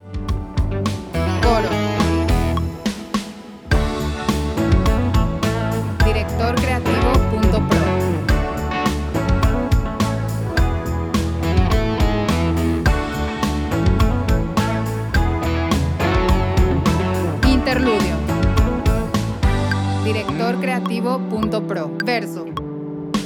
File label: 105bpm 4 4 A